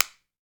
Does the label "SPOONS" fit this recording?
Yes